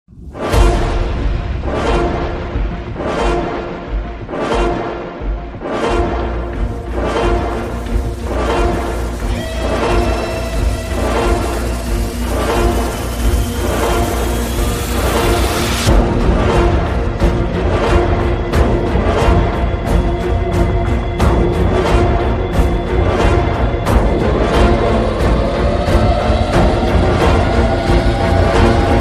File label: garuda entry bgm